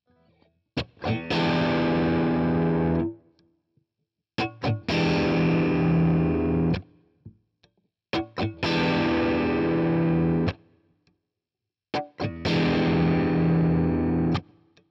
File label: Nashville Tele Push Pull Overdrive; Fender PP